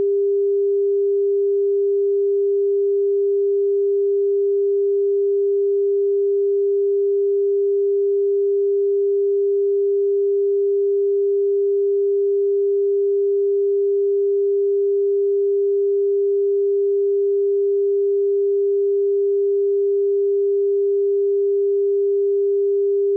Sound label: test 1024, sine